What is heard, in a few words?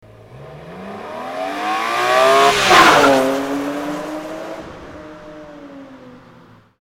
magnaflow exhaust systems